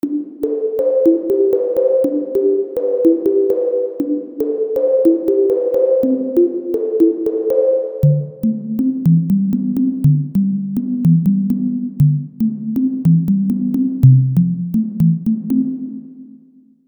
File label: bell fx